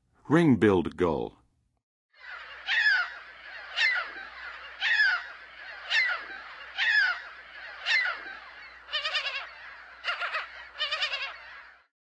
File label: Ring-billed Gull, Western USA Songbirds; North America